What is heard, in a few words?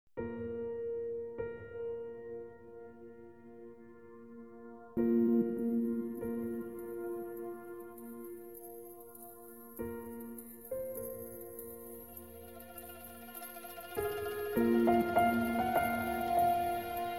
Piano Moods